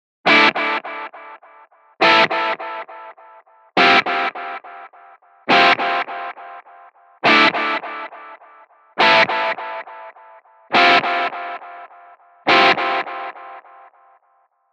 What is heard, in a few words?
DELAY, Guitar Rig; GR5 Presets – Rope